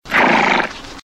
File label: horse snorts